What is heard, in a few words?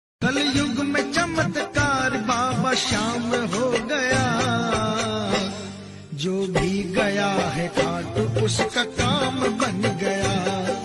Hindi Ringtone